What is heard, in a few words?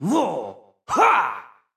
target, shaman skill